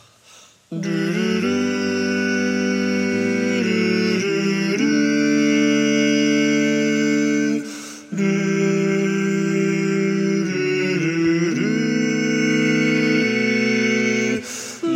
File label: no baritone; Baritone Part